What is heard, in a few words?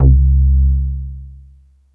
MOOG LOW C2, Bass Analog & Synth; USB Soundscan vol.12; AKAI; VINTAGE